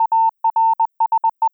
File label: morse